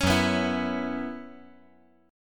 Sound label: F sharp 7th Suspended 4th